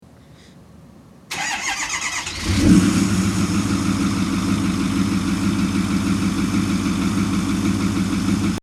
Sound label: ford start